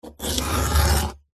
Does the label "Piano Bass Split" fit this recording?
No